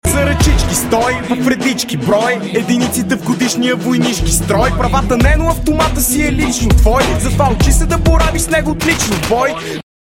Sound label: win, music